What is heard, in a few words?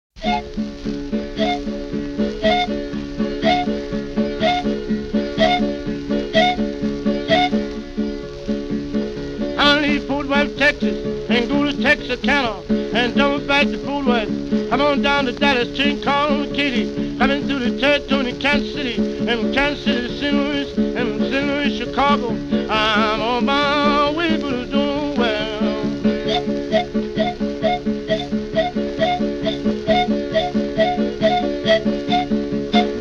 Flutes de Pan Quills